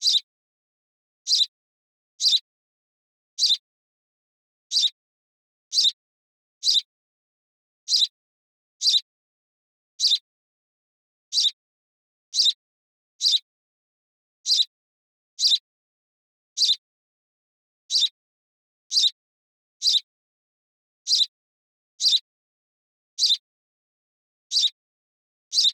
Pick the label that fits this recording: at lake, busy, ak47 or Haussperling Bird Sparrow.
Haussperling Bird Sparrow